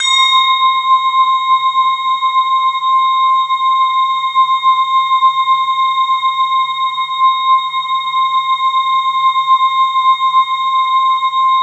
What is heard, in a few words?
BELL EPIC; MORPHEUS